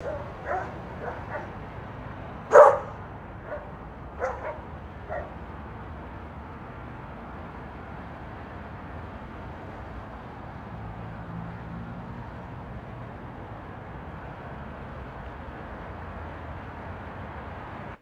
distant dog barking over traffic hum; animals

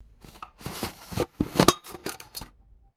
metal can removed from cardboard case; foley

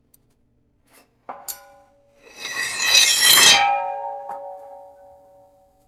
metal utensil scraping a pan

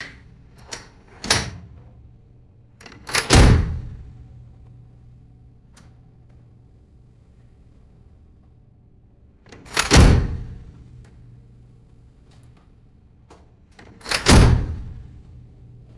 heavy metal safe door slams

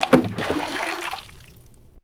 solid object splashing into water

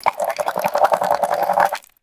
frothy bubbles gurgling through a straw; water

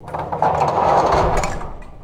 metallic sliding barn door closing; doors

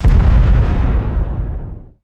deep explosion boom and sub bass rumble; explosions

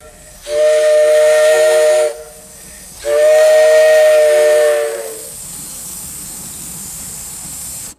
short bursts of a steam train whistle; trains

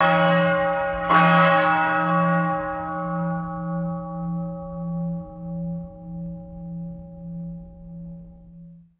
large clock bell chiming twice

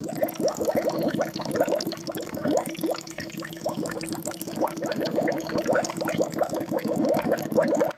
hollow water gurgling in a pipe

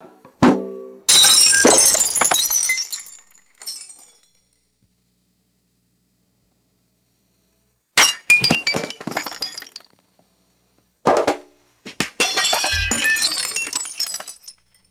large glass jugs shattering and bouncing

